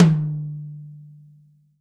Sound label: TOM, Real Drum Kit